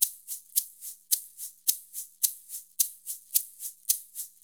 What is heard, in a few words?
percussion loops 108; Sampleheads; NYC Percussionworks; 90 s Sample CDs